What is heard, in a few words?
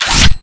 SFX Weapons Rocket Launcher; unreal development kit